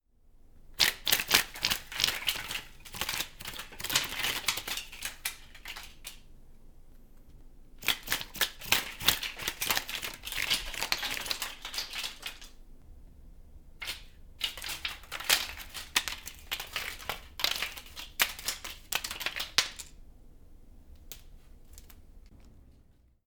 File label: Pickup